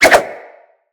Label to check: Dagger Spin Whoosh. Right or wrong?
Right